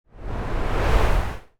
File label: charge